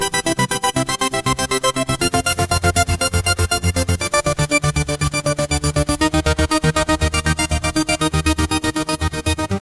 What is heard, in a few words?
short punchy synth beats, ascending